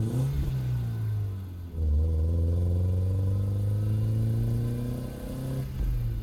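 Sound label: engine low hit; Blind Drive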